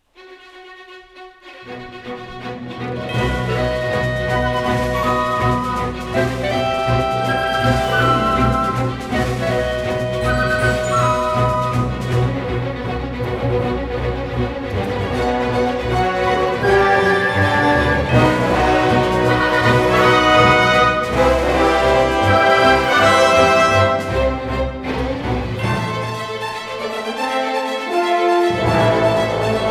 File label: Platform Orchestral